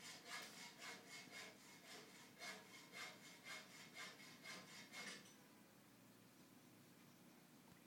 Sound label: sawing wood